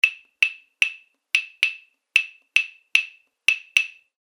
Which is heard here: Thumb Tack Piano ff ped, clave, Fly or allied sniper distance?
clave